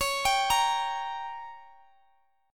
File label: G Diminished; x,x,x,18,20,18